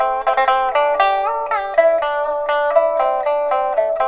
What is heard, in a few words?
PIPA, 90; INIS - Opium